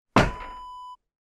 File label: sauna water bucket set down